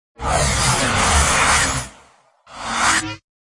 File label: Storms Stinger; Gothic Storm Music; Advanced Sound Design 2012